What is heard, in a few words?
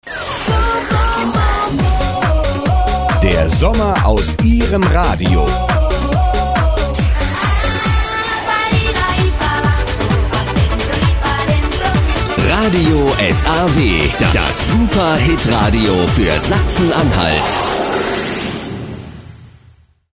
saw hook